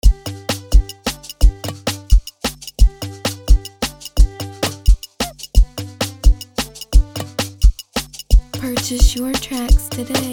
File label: 87bpm, afrobeat instrumental